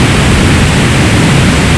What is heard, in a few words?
birds